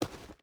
footstep rubber boots dirt walk; Rust

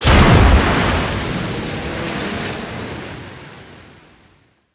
damage vehicle; World Of Warships

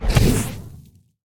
depth bomb; World Of Warships

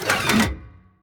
gun reload; World of Tanks

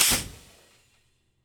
Aircrafts Su-27 Cockpit Canopy Open End Cockpit; DCS

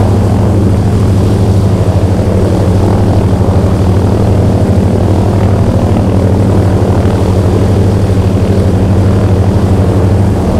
c46 rear, engines; War Thunder

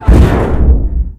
Damage Gear Breakage In; DCS

